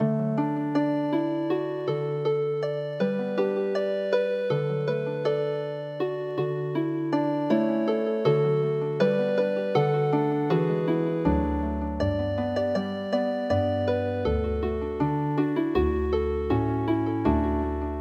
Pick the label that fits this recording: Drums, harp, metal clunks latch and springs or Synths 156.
harp